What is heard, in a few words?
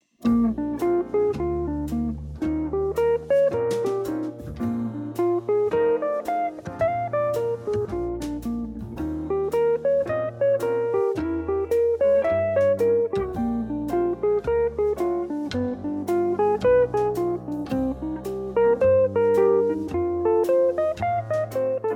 jazz blues arpeggio study bb, guitar; Jazz Blues Arpeggios For Guitar; Jazz Blues Arpeggios For Guitar (part 1) - Mini Lesson 04 (ML 04)